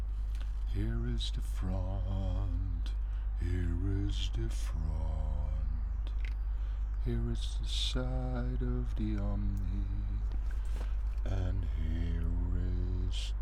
Voc Omni

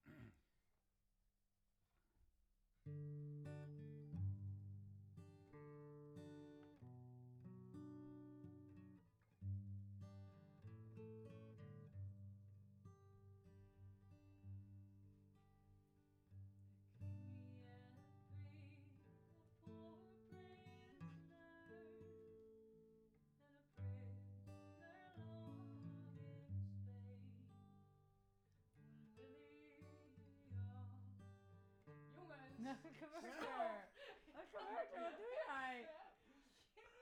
Chordz

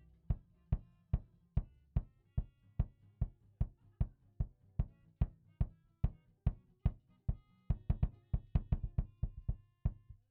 Kick

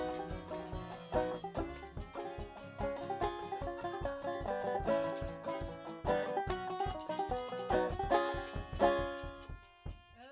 Banjo

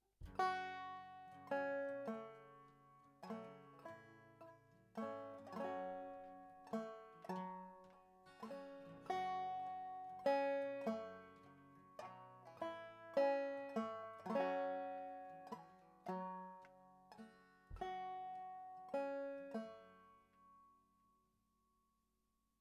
Banjo

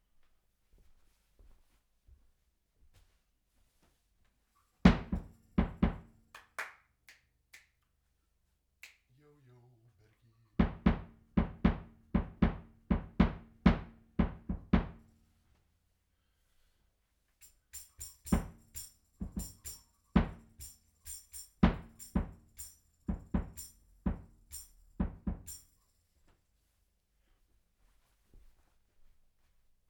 Banjo

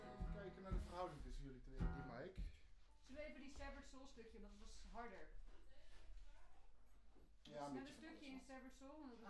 Voc Fig8

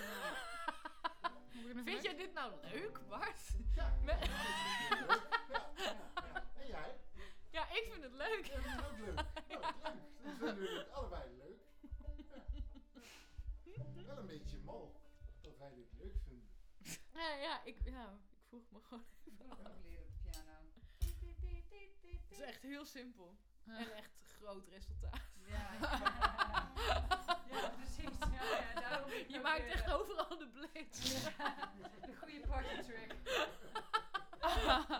Voc Fig8

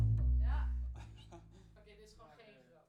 Bass Mic

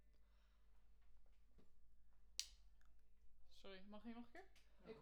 Spoon

